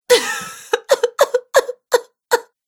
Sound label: Woman Crying Sobbing; Crying Sound Effects